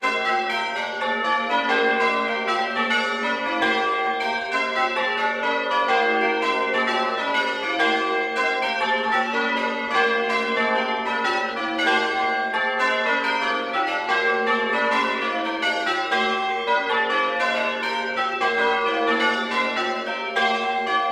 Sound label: bell church bells ringing